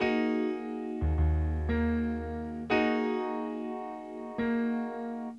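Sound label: piano, Hip Hop and R&B Drumloops; Best Service ProSamples vol.01; AKAI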